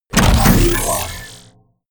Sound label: sci-fi click neutral heavy; hits impacts